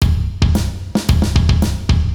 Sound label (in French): Rythme